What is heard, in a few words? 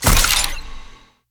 sword whip stab